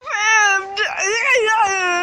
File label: Stupid Laugh